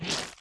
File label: spirit death; FlyForInsanity